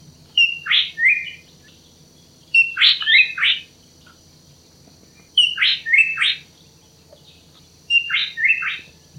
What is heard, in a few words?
Drongo calls, Ulu Kinta Reserve; Ipoh Perak Malaysia